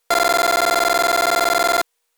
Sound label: Highpass active; HDSDR; 433897kHz